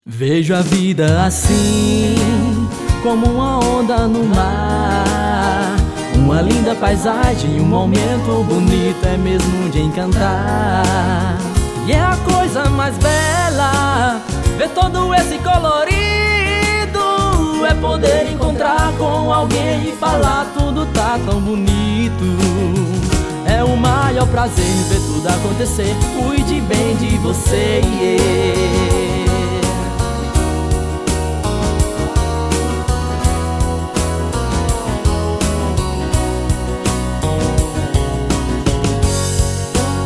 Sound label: JINGLE; HOSPITAL DE OLHOS